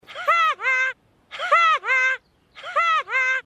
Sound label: haha